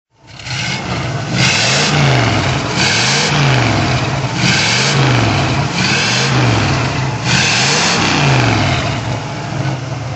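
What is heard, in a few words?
oldsmobile super 88 1955 throttle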